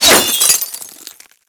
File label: Glass Impact; Warframe